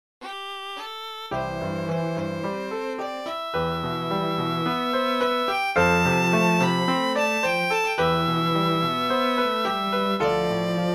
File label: Piano Trio